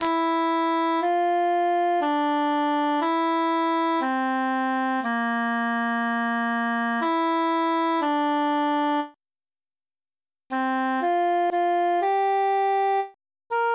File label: altus solo